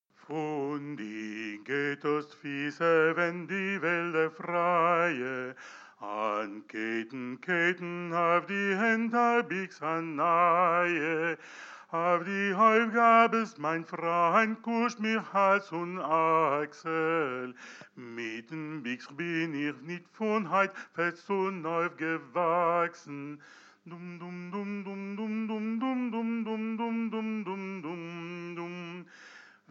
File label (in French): homme chant; yid du partizaner